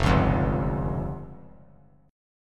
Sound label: F Suspended 2nd Sharp 5th F down; Fsus2#5 strummed; JGuitar